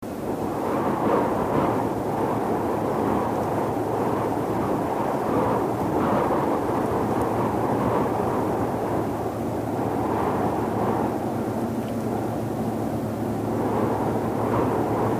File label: Ambient Wind - Medium Loop